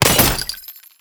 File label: Ammo Bounce; Ammo Ability; Warframe; Warframes; Frumentarius